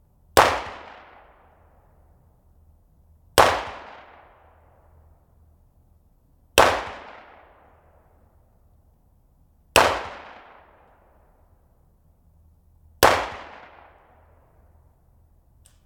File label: mp5 forest road 10m behind gun pointing at forest off axis; mkh8060; Sonniss GDC